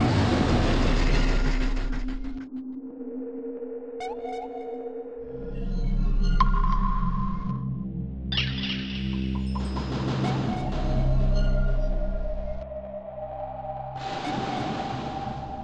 Deep Chill (Tension) (Loop); beta unreal; Inoxx

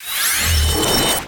In

